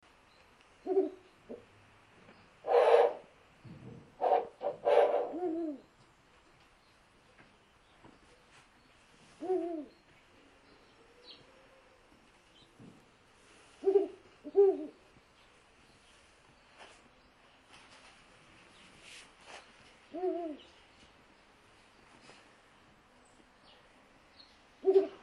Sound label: Uralensis